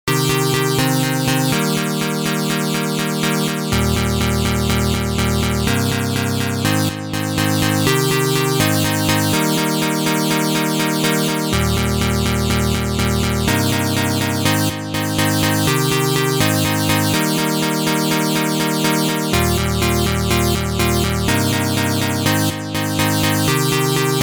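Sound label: Simple PWM Lead Dry to Wet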